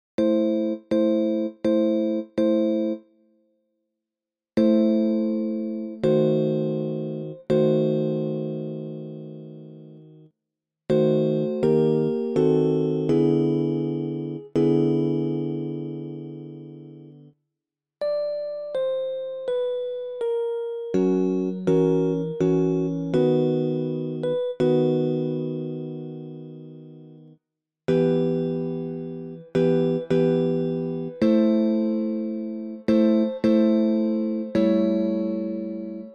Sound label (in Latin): tutti; Vivaldi Gloria; Qui Tollis Peccata Mundi; kirkkokuoro